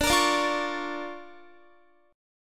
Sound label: D Minor Major 7th Sharp 5th, D, Guitar; DmM7#5; strummed